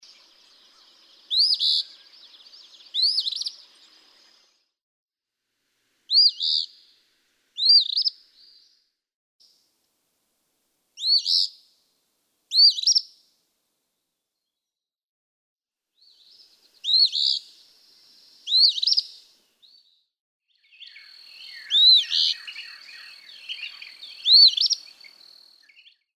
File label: Eastern Phoebe